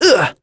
Vox Damage; Lucias; kingsraid; voices heroes en

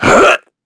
Vox Jump; en; voices; heroes; Shakmeh; kings raid

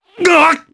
Damage, jp; Baudouin; kingsraid

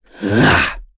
Attack, Vox; kingsraid; voices heroes en

